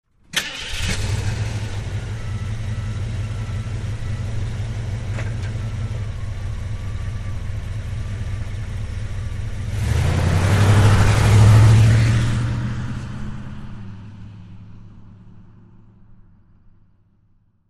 Wagoneer: Start, Idle And Medium Away, Jeep Wagoneer